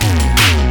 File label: HIT